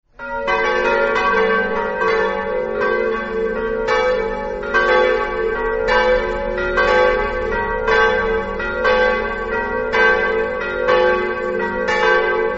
pieve, campane

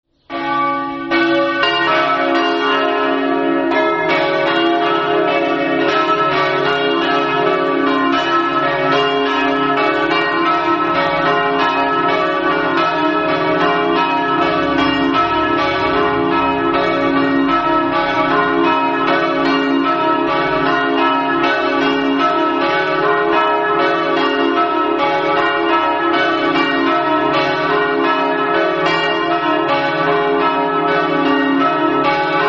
rodeano, campane

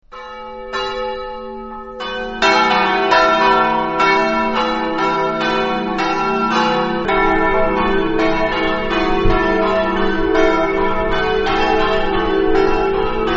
flambruzzo, campane

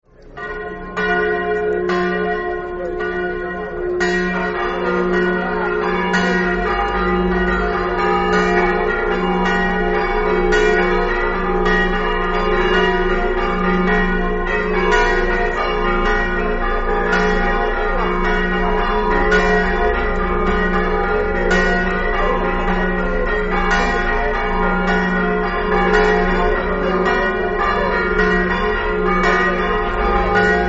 dolegna, campane

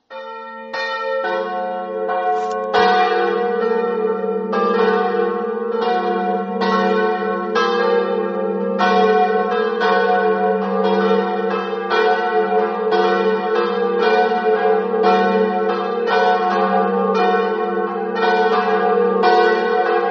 sauris, campane

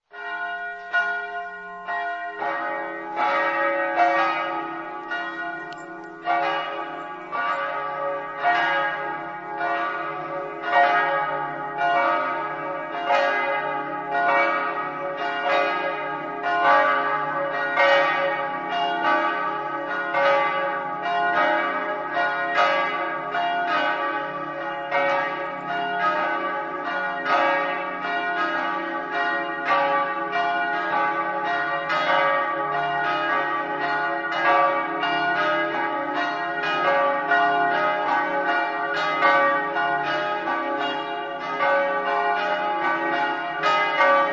passons, campane

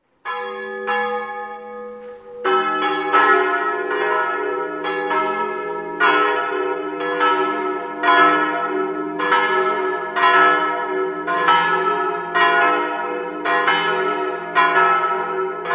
porpetto, campane

